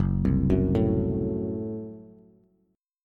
Gb Diminished, down, Bass; arpeggiated; JGuitar

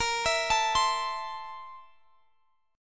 A Minor Bb, guitar; Am/Bb; arpeggiated

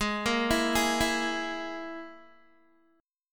G sharp Suspended 2nd Sharp 5th, Guitar; G#sus2#5 arpeggiated; 15,13,16,13,16,x

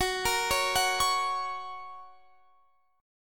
F sharp Major, Guitar Standard; arpeggiated; JGuitar

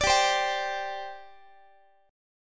G Suspended 4th, Guitar; Gsus4 strummed; x,x,17,17,16,18

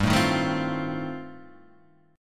F 7th G, F7/G chord; F7/G strummed

